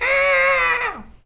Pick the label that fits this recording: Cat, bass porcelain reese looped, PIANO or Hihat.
Cat